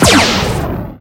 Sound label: gun turret fire; darwinia demo